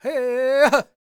powwow, World Voices, Lakota; Spectrasonics Vocal Planet CD5

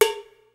PHAT TRAX KIT, DRUMS; Kid Nepro; Elite

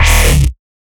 Growl Bass; neuro; BIG5AUDIO

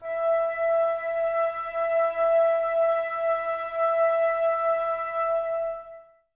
Flute, Woodwind

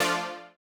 Housey Stab F3; KG MegaSaur

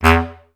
BCS STAC E1; Best Service; Peter Siedlaczek's Advanced Orchestra

